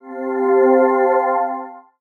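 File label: sound of a beam of light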